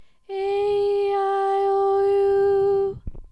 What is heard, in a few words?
aeiou Mid